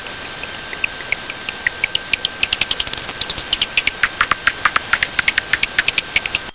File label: Bat; Hetrodyne